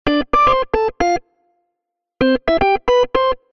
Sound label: Organ, Long Riff Calendar; HTC; Sound Sets